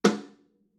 snare no snare fff

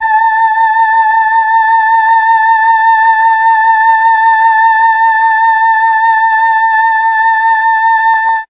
A3 female, mellotron; CHMB FEMALE

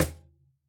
Cajon hit f

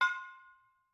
Brake Drum Yarn, Struck Idiophones

